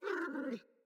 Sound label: Puppy Idle Coo; Enemies; Vocals; Kubrow